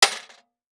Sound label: Impact Sheet Metal Hit By Small Metal Pipe